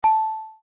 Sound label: ding